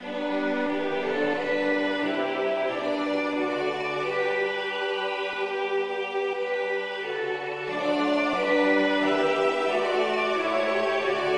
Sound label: polyinstru; hymns; Himnario grande